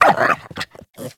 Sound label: Puppy Idle Fall; Vocals; Warframe; Kubrow